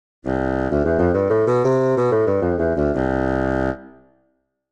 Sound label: bassoon; garritan